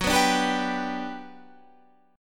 G Major F sharp, chord

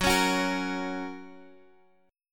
Gb Major, Guitar Half Step Down

